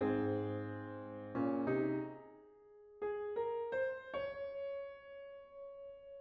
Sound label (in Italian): Frammento per pianoforte